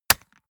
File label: gun shot silencer wrist